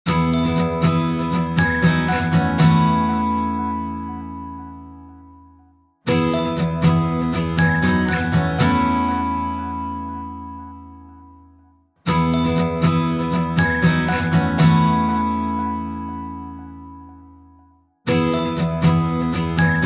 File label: Samsung Galaxy J8 ringtone